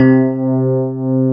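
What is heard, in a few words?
C4 electric piano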